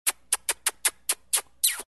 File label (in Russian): pocelui